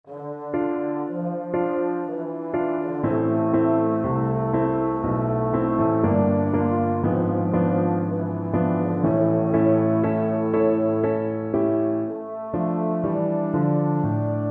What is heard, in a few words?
euphonium